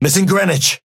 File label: Synth ping missing